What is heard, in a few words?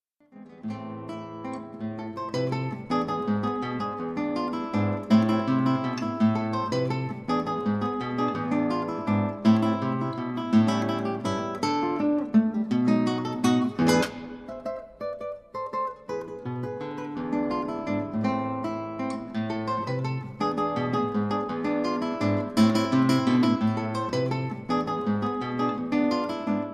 aire criollo, guitar